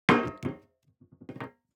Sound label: DICE on Metal, Throw and Roll, Foam, 1 One Die; Samples; ArticulatedSounds; DICE Sfx Party (Extended)